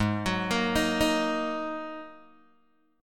G sharp 7th Suspended 2nd G sharp, down, Guitar, Open G